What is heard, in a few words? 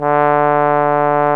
Trombone; Solo Brass